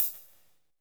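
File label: hi-hat, drums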